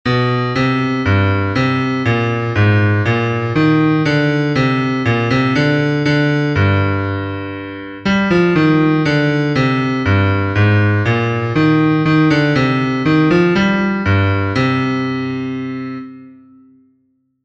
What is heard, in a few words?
Bass